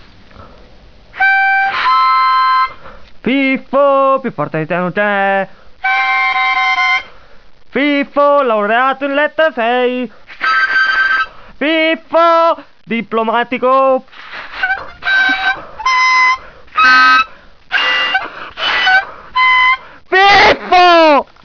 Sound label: harp